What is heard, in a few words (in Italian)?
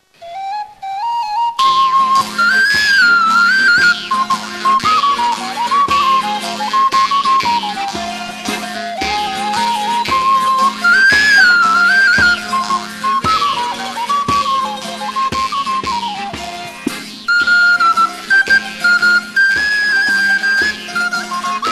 Stacchetto siciliano